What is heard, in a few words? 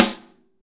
snare dry; Real Mega Drums